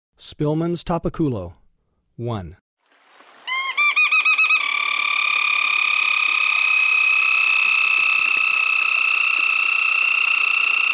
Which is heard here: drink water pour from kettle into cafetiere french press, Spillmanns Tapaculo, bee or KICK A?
Spillmanns Tapaculo